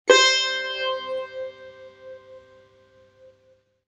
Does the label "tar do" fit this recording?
Yes